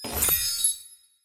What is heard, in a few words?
hunted Plus Landed; turn your fortune